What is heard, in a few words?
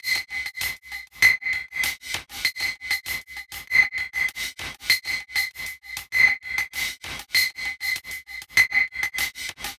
METALISMS; Spectrasonics; Metamorphosis